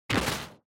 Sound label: Motion Fall Gentle